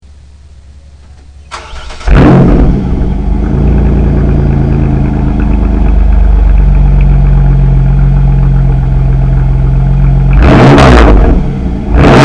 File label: Borla 2.0 Baffle, Camaro